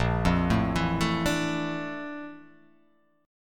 Bb Major Sharp 11th Bb down, chord; arpeggiated; JGuitar

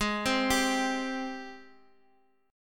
Ab Major 7th Ab, Guitar Open D; down

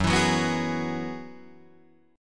Gb 9th Suspended 4th Gb, Guitar Drop D

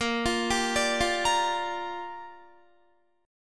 Bb 6th, Guitar; arpeggiated; 18,20,17,19,17,17

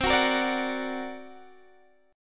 D Augmented 7th C, guitar

